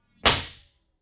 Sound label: impulse response; Corso acustica